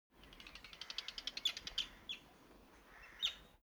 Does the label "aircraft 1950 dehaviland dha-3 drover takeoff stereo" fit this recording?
No